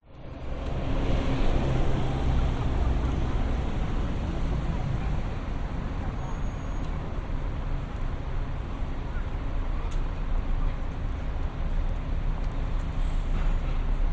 public places city traffic pedestrians busy entrance bridge brisbane; victoria bridge